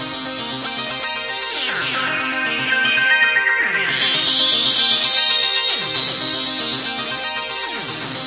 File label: 116 hypno synth variation; SYNTH AND ELECTRONIC LOOPS